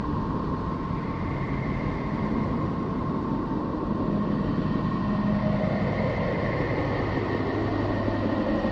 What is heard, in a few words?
ambient battle; World Of Warships